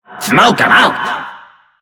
attack

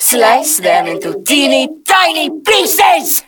attack; Awesomenauts; Qitara

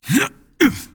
Grunt Jump; paladins; Androxus

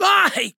Grunt Hit; Barik; Paladins

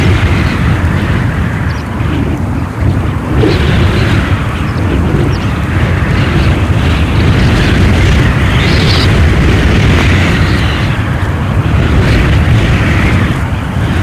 Haste Loop; Battlerite; Nomad

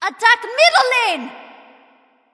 Attack; Smite; Athena

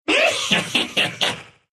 Nyx laugh, ru

